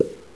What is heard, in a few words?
Popping windoid; Stanley's Sticker Stories (1997)(Edmark)